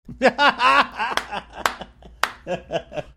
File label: laughing clapping hands